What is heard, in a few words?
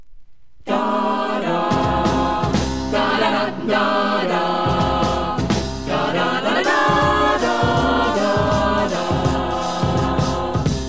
vocal jazz